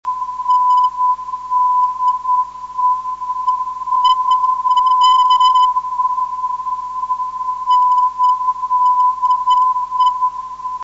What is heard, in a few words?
465khz